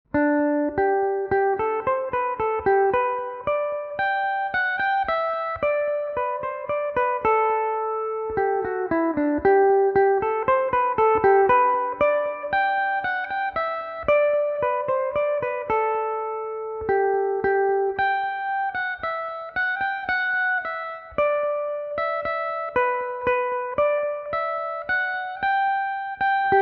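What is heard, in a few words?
G major, Irish mandolin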